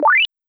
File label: delete; LNXBOX